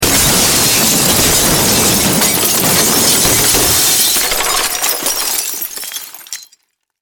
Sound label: Impact Crash Wood Glass; Materials; SFX Bible